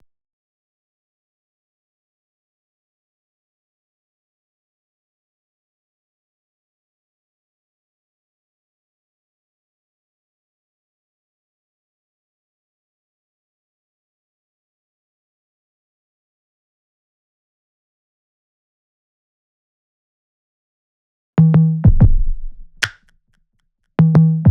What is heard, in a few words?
Drums 92BPM Hard Bounce; Runaway